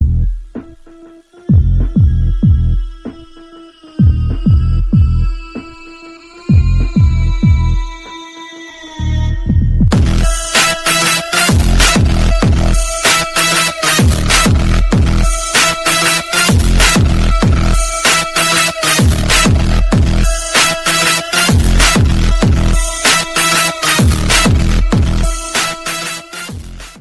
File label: Astonishing Bass